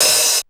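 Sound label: TOM open hi-hat; 90 sSampleCDs; Crypton - DrumBase5000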